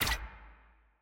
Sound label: ui map festivities